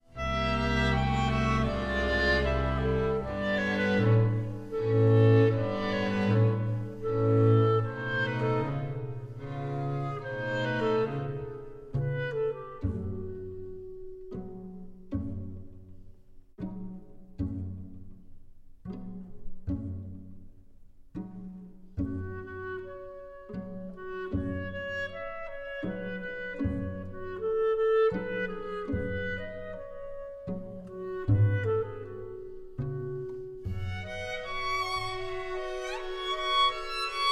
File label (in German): Streichquartett; S. Laks; 2. Satz; bearb. Fussenegger